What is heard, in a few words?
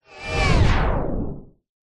sound design whoosh into slow motion; zapsplat